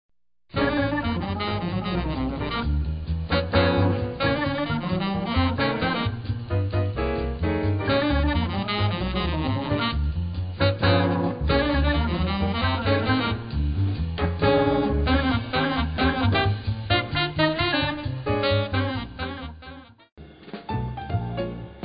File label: Jazz Club; on hold message music